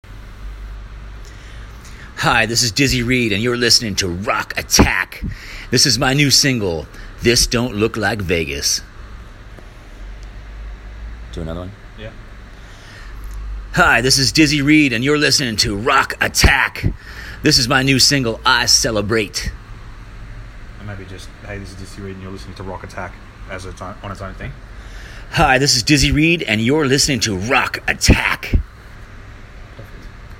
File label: jingle; Rock Attack - Your Rock Radio